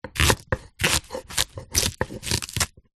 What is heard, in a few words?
food vegetable chop knife